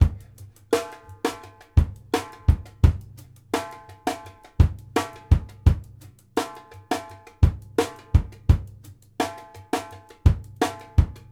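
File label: straight, drums; Sampleheads; New York City Drumworks VOL-1; SP BLASTX 84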